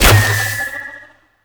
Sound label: Choir Bow Fire; Warframe